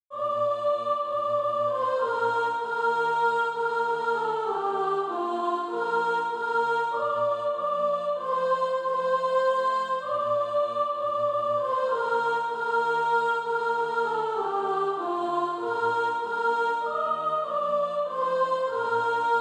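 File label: soprano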